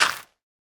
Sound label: fill success, block, composter; Minecraft